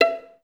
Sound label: VIS PIZ E4; Best Service; Peter Siedlaczek's Advanced Orchestra